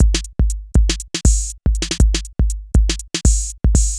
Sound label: Synth Loop, BPM120